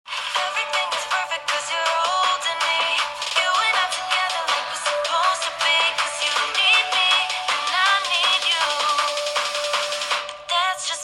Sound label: Speaker; AQUOS sense7